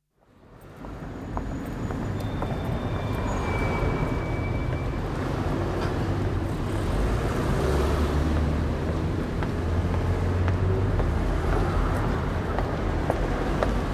Streets, High street, moderately busy with passing footsteps and occasional close voices; Crowd; BBC